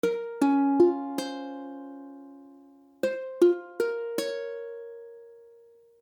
Bbmadd9, akordy ukulele

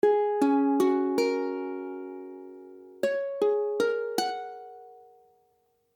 Fisadd9, akordy ukulele; F#add9

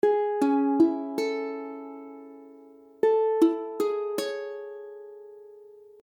Amaj7, akordy ukulele

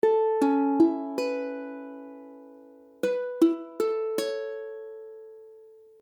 Aadd9, ukulele

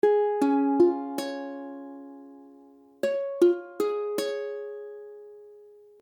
Cis, ukulele; C# akordy (=Db akordy)

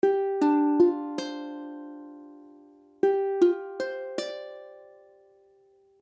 G7sus4, ukulele; ukulele akordy

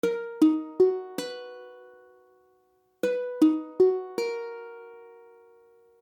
Hmaj7, ukulele; ukulele akordy

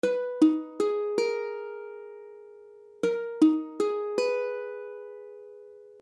Abmadd9, akordy ukulele

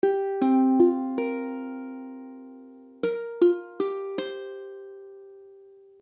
C7sus4, akordy ukulele

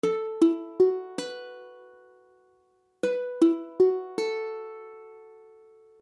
H7sus4, akordy ukulele; H akordy (=B akordy)

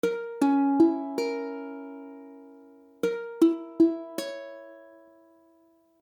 Bbdim, ukulele; Bb akordy (=A# akordy)

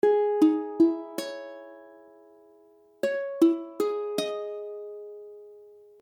Cismadd9, ukulele; ukulele akordy; C#m(add9)

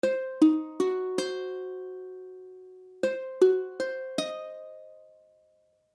Cdim, ukulele; ukulele akordy

